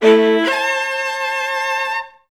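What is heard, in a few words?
violas A3; Best Service; Peter Siedlaczek's Advanced Orchestra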